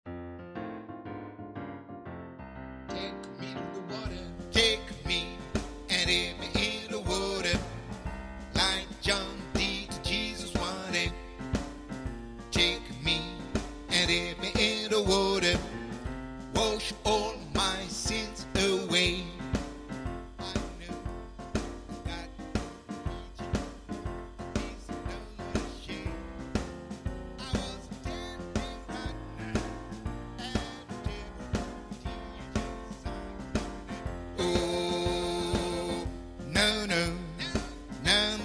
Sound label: None But The Righteous Alto